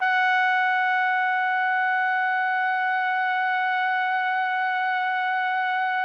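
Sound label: TPT, trumpet; Roland - LA Composer vol. 3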